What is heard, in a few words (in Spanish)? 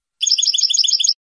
bibleos golpeados graves, campero